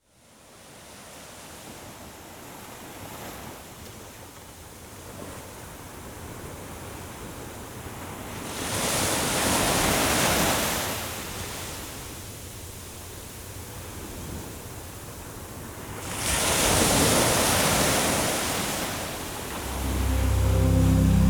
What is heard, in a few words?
Relaxation Music, Ocean; Enlightenment; Healing